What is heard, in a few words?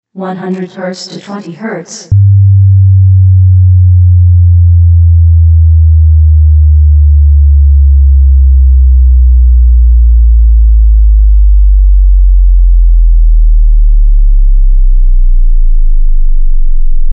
100Hz to 20Hz sweep